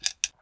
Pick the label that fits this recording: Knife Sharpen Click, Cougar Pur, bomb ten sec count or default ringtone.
Knife Sharpen Click